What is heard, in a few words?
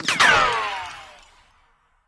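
ricochet; gmod; viper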